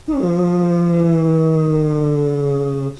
moan

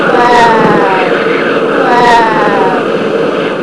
WIND

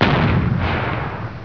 CANON

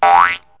SHORT CAR; toon

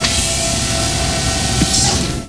door open

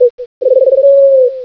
bird

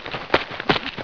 MARCH

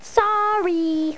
wrong

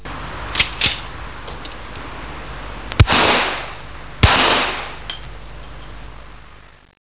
45 ACP, GUN SNDS

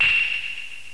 Tink

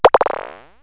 SHRT BNCE; toon

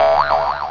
boing

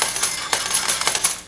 win hand

